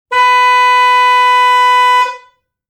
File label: car honk; transportation